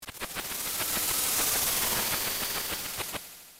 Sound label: harvester; ff3; ff3us